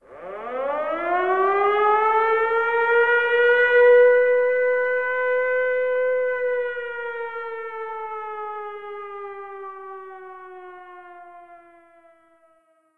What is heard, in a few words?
round start; cstrike